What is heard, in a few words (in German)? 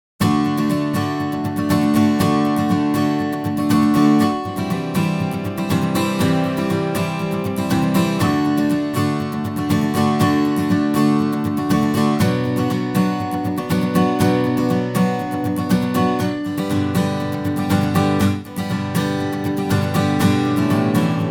Akustik Gitarre; TLA100A; Softube